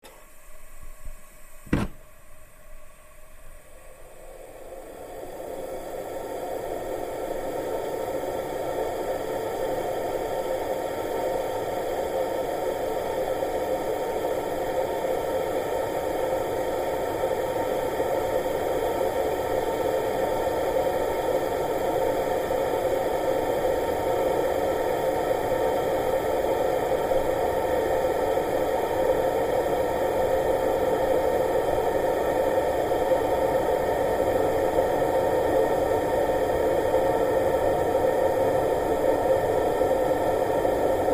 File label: Ambiance Internal, steam